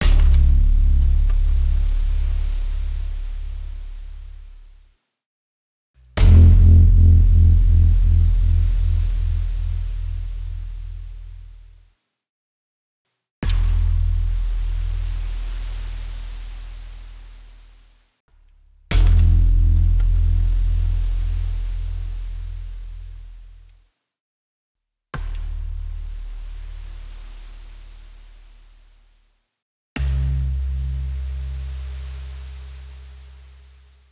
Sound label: gongs long examples